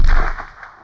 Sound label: chimera lava damage